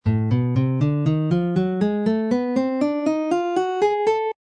a diminished scale fifth and sixth string tonics alternate pattern, guitar